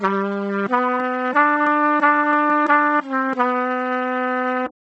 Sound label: separated trumpet